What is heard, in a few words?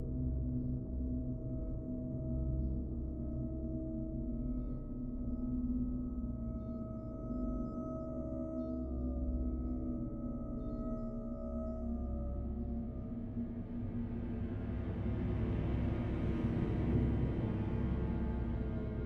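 ambient orchestra; The Walking Dead Survival Instinct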